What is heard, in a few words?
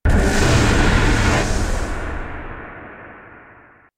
Soul Release; Spirit Vessel